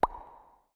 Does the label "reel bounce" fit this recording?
Yes